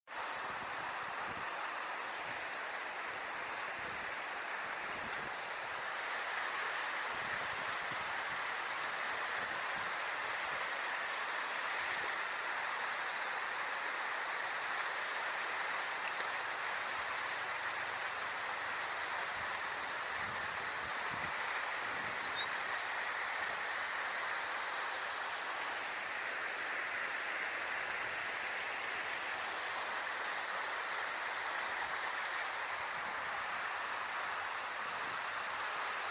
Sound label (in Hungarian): őszapó hangja